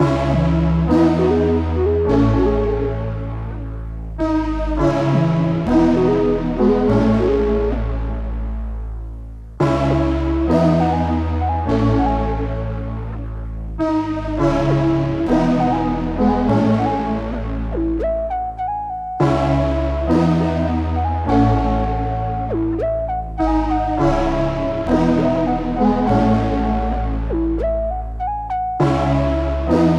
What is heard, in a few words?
200bpm E major